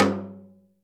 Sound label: DRUM, PERCUSSION; Crypton - DrumBase5000; PERCUSSION#1